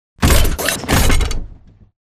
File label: dodge left; Titanfall 2